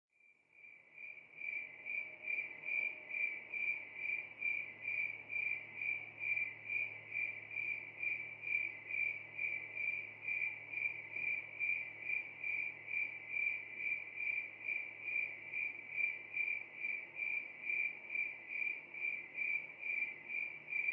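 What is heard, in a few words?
Crickets Night Ambience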